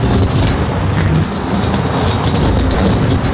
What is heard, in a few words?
roll skylights, terrains; Tony Hawk's Project 8; XBOX